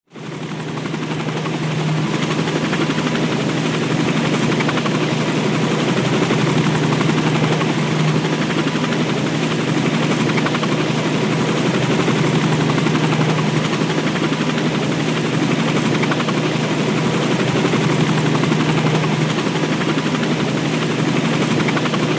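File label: Heli Traffic Hover Steady, helicopter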